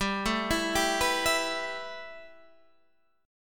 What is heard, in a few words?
A 7th Suspended 2nd, G